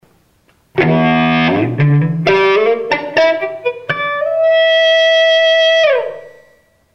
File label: Spiccato Across Strings